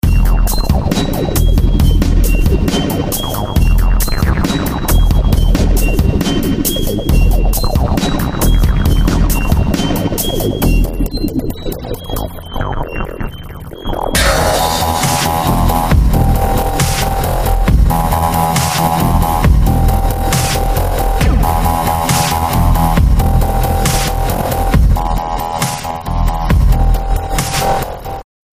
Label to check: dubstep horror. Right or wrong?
Right